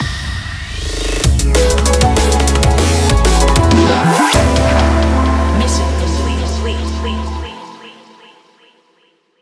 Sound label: MUSIC JINGLE MISSION COMPLETE; neon white; ps4 ps5 switch windows